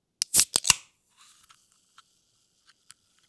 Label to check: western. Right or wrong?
Wrong